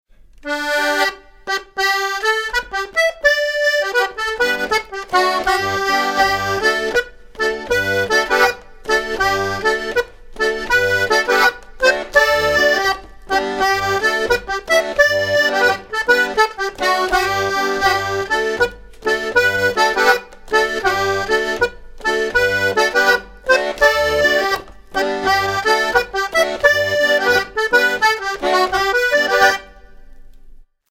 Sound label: Willow Tree 1x Chorus; Morris Hey